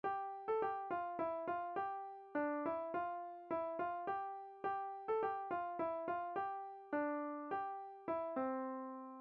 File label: play piano by ear; London bridge